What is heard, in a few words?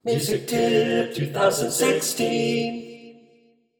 Jingle; MusicTipp